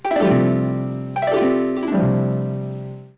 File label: Harp (long); 250 Desktop Themes